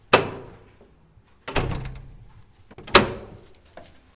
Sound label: closing an access controlled door